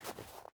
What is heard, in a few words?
footstep boots snow walk; Rust